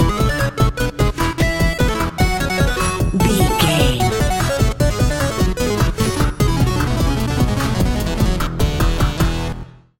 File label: hero theme video game stinger